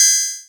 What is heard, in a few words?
Vermona Hat; techno drum fx; Analogue DrumHits